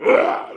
back, monster

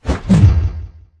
swing; monster; maenghwan

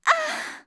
fall; monster; bkboss

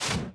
close

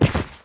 drop; monster; thiefboss1

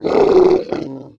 fall; god monkey

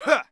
throw; sura; fishing

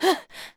damage, monster; metin2; bkarcher

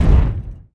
drop, golem pawn

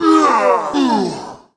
dead, monster; sugu general

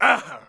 damage, thief; thiefboss1

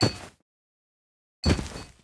drop, immotal ghost; monster

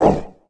damage, monster; metin2; recycle monster

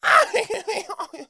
dead, monster; milgyo religionist

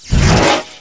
skill, spider king

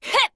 attack, monster; bkarcher

